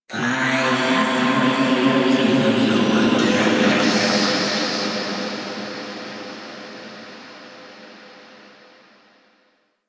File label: Cave Echoes; Queen; Warframe; Ambience; The War Within; Golden Maw